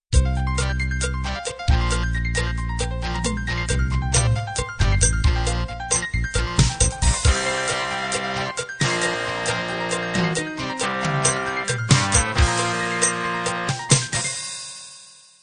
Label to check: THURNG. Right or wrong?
Wrong